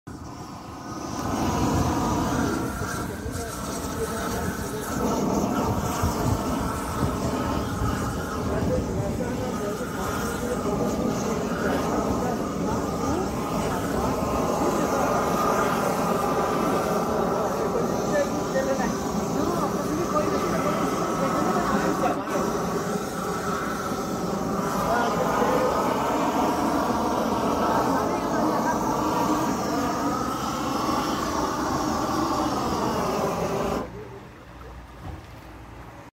isogaming

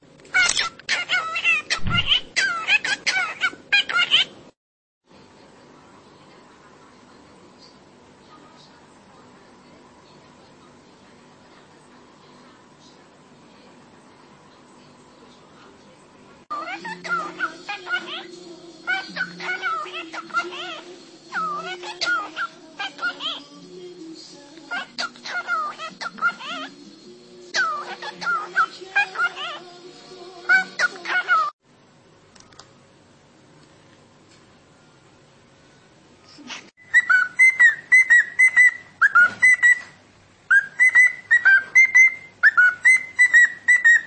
Cockatiel

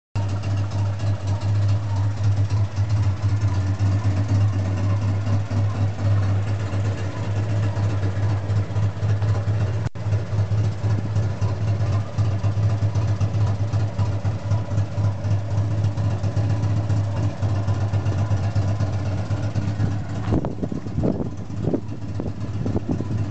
Dyane, اگزوز

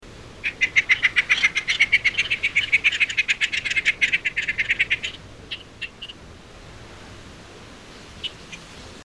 Inca tern

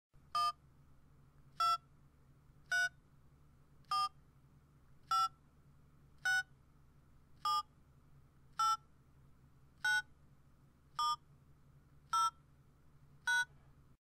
push button telephone; شماره گرفتن تلفن دکمه

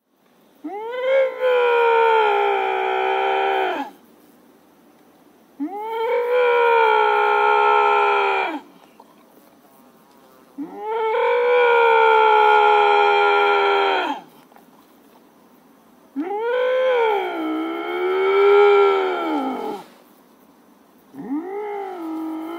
iberian lynx; لینکس ایبری

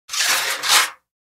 scraping iron

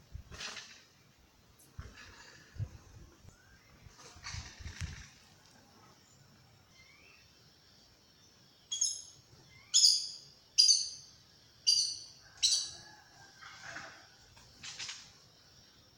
Aracari

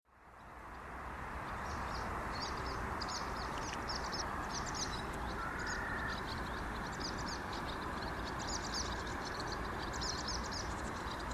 swallow sound

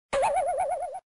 Dizziness cartoon; کارتونی سرگیجه

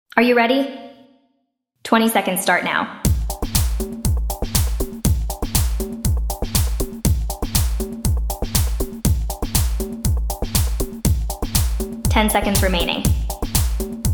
20 Seconds Game Countdown

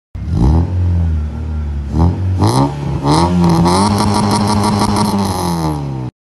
b520 exhaust

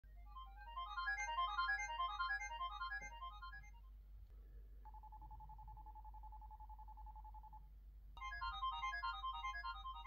Siemens

